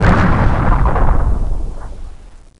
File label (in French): expl camion; deep worm